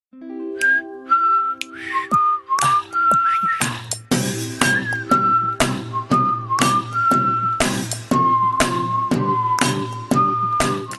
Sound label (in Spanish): flauta unica